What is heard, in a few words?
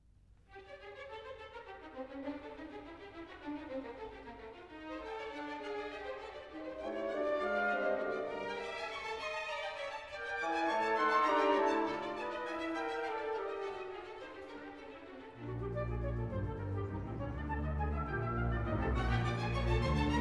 Enigma Variations, Allegro; Elgar